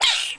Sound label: Aerbunny hurt